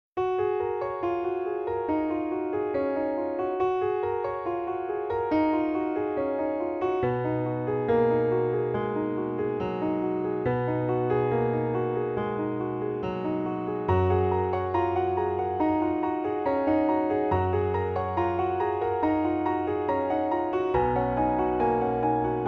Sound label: Hopeful Instrumental